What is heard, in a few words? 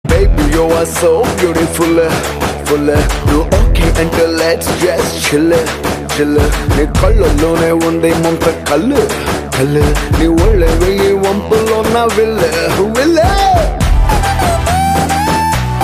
Love Ringtone